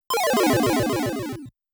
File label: Credit Sound; Bravoman